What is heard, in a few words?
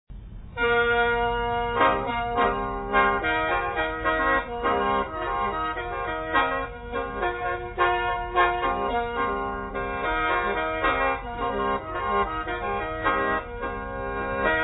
Khaen song, Lao Khaen Music